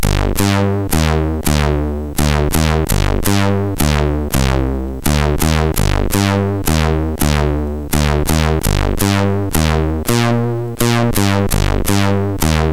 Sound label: Bass, MOS6581; sid; MOS6581R3